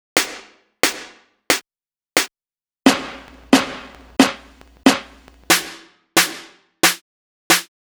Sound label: snare snare's little helper